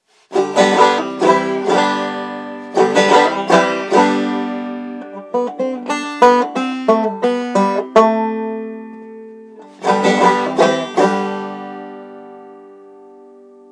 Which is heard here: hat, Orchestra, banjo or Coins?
banjo